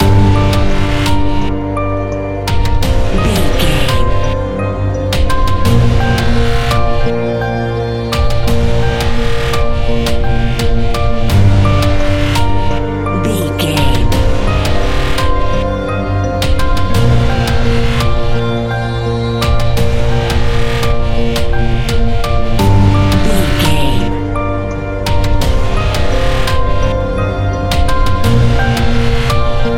echoes modern horror

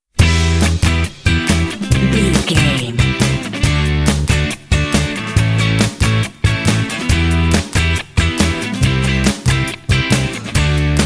indie trojans rule full mix

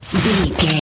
footstep snow slide

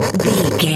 radio tv electronic static

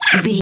goblin fairy attack low

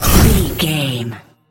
ice blast projectile spell

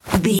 foley cloth light fast movement

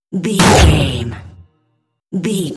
cinematic stab hit trailer

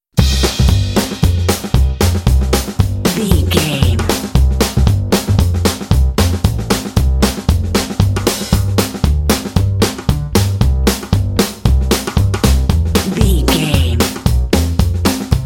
polka comedy party

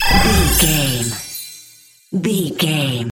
vanish spell flash potion

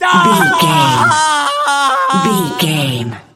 voice male death high

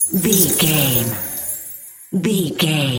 ice spell forming shards

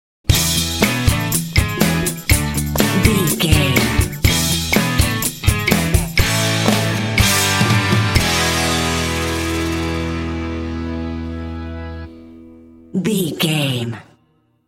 indie pop jams sting

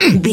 voice male hurt pain

